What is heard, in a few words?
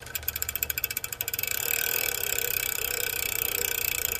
Bicycle Pedal Backwa